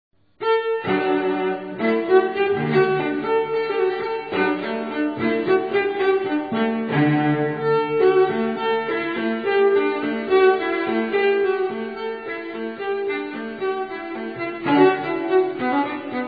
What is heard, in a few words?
Suite 6 D Major, Gigue; tortelier; EMI Records